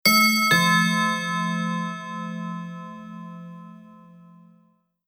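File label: clock ding dong, ringeklokke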